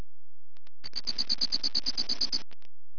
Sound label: junco